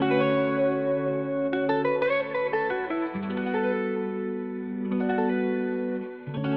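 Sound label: GUITAR DRY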